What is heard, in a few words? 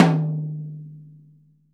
P.HTOM MF, VELO TOM